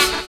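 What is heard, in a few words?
SAX N GUITAR; Univers Sons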